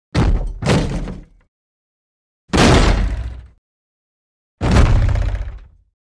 Door Breaks Break Down Wood Door With Thud Impacts; Hollywood Edge Foley Sound Library